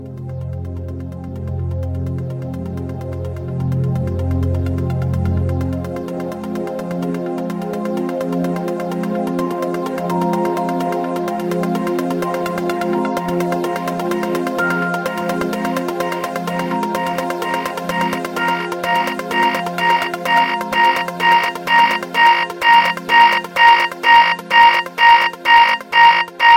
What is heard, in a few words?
BB Pro Alarm